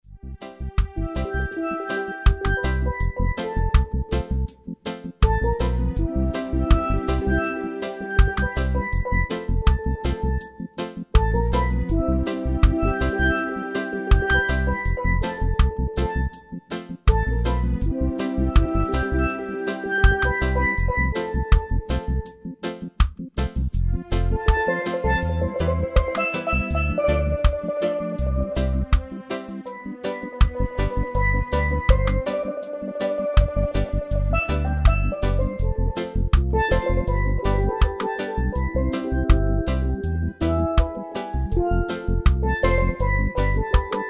Steel Drum

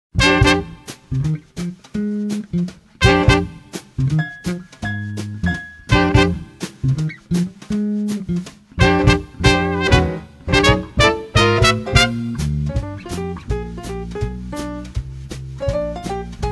Combo, Swing Band

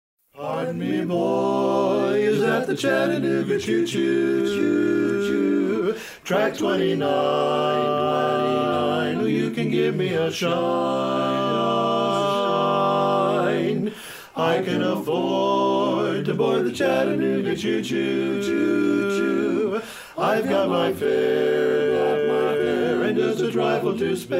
barbershop quartet

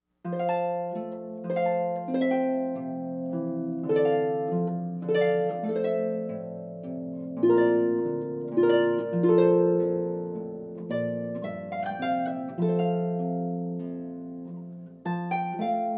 Ground in F, Harp; Purcell; Kirsten Carrell

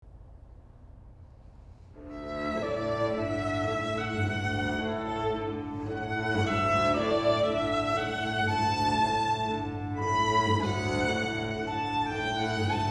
Violin and Cello; Classical

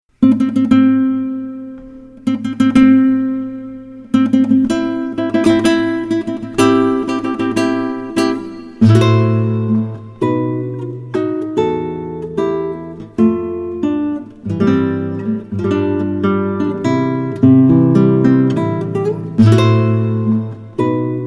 Classical Guitar; Wedding March - Wagner

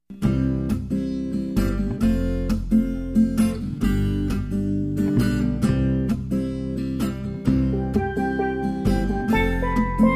Jazz Duo, Strings Steel

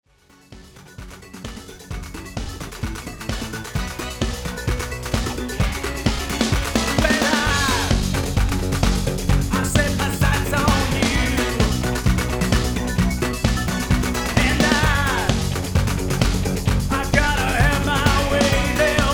80s band

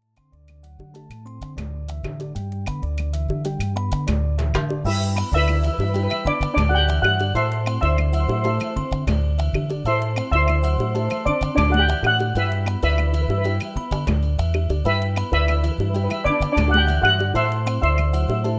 Yumbambe, Steel Drum Band

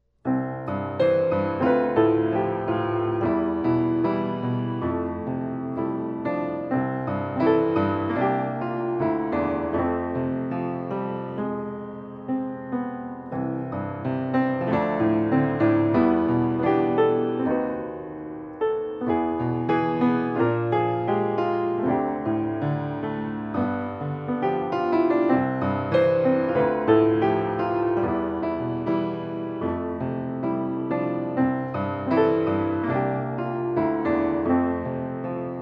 Piano

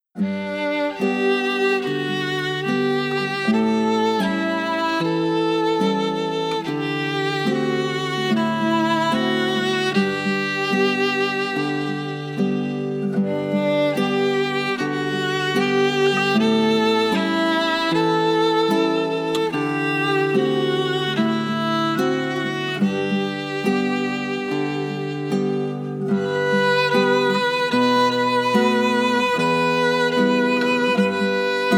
classical guitar

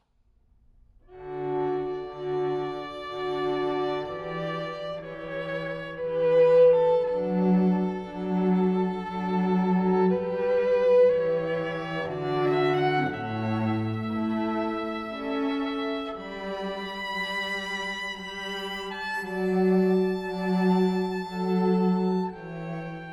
String Trio; Largo from Xerxes

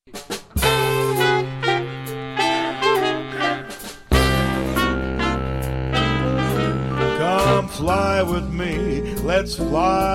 VOCAL Live, Big Band

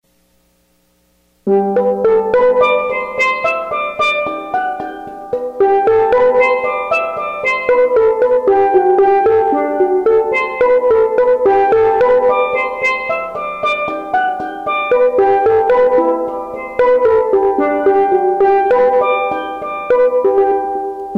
Steel Drum

